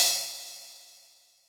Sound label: FX, Cymbal, Yamaha DTXpress